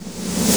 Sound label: SD REVERSE, snare drum; SNAREDRUMS